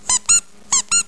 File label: squeak